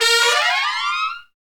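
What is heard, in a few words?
trumpet; Roland L-CDX-03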